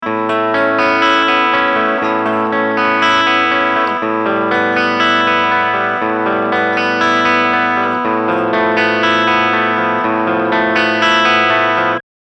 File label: Bridge Clean; eclipse active; line6